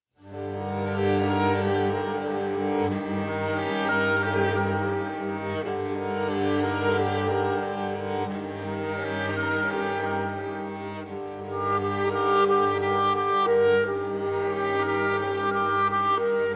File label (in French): instrumental et melodie